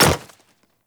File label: Hyena Micro Step Default, Footsteps; Warframe